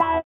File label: sitar, Indiatek; Spectrasonics; Metamorphosis